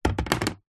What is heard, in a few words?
leisure toy train wooden track piece place down in plastic box; zapsplat